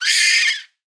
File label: japanese monkey cry